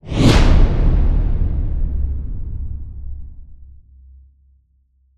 Swell To Impact Flanged; bottlerocket

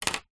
office pen plastic biro down on table

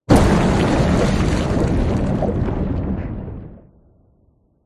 water whoosh impacts movements; george gazetis

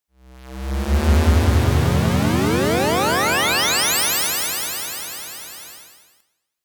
sound design ascending fm delay; zapsplat

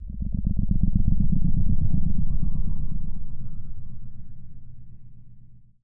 Transition Whoosh Flutter; bottlerocket

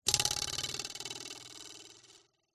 cartoon spring twang bounce metal ruler twang

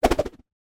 weapon shuriken flying star throw; kung fu

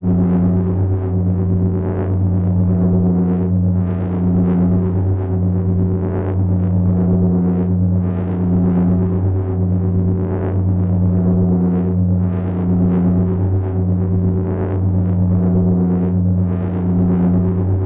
science fiction forcefield; sound effects one

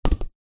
sport beach soccer ball down on ground

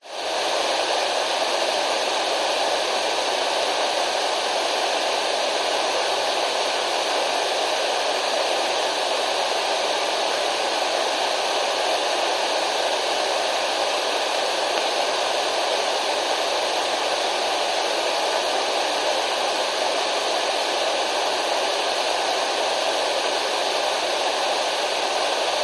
radio alarm clock stereo static; zapsplat

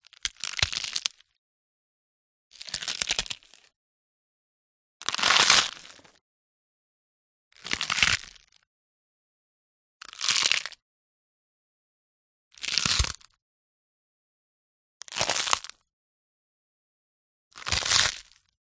crunch slow cabbage; audiotank

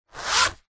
foley zipper clothing or bag single fast movement; zapsplat

